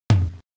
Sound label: Drum BD; WarioWare Inc